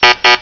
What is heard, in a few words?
double buzz